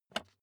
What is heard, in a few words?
office chair lever up